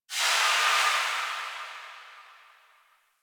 Dist Steam Burst; Warframe; Venus Open World; Venus Solaris United

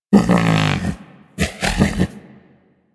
Predasite Vocal Snore; Warframe; Hunting Critters

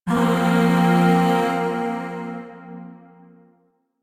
Shawzin Blues Chord C; Chords; Warframe; Zariman; Void

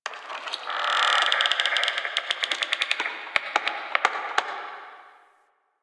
Tree Creak; Warframe; Quests; TheNewWar; Ambience; Drifter Camp

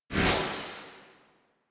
UI Theme Open; DE; Warframe; Zephyr

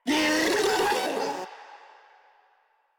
Infested Ancient Vocal Attack

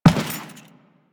Step Default, Footsteps; Warframe; Teshin

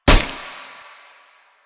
Weakpoint Impact; Enemies; Lasrian Tank; Warframe

